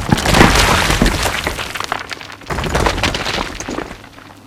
break stone

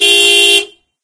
alarm

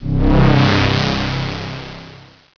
satlaser

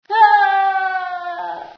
scream, female sci

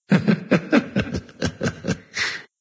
laugh; sven; gman

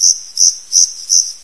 swamp; jungle

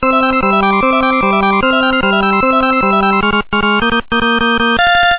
win; galaga; sven

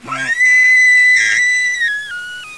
pit drone alert; betapitdrone

